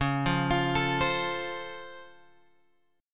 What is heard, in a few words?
C sharp Augmented Major 7th C sharp; C#+M7 Chord {9 8 X 10 10 8} arpeggiated